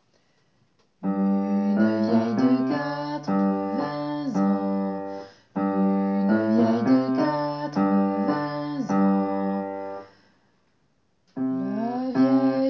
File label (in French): la vieille basse